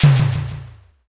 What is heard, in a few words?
pistol